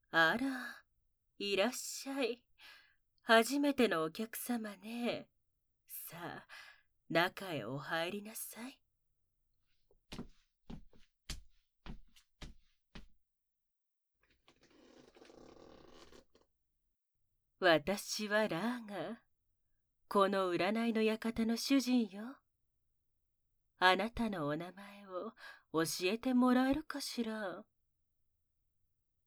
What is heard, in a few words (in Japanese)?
ディープキス; それが始まり; 快楽悪魔